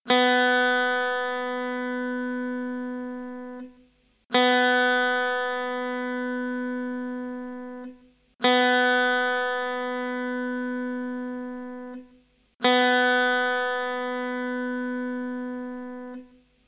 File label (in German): Stimmton h